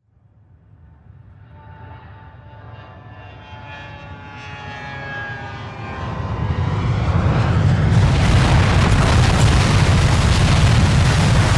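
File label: Plane crash, passenger plane; Сигнал в салоне самолёта, звуки двигателя и другие шумы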